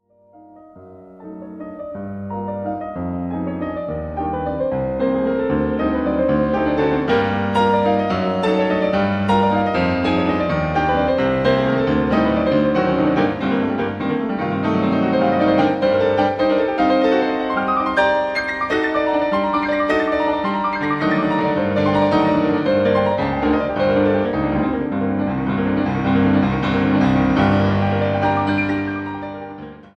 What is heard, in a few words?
Marche Funebre, instrumental classique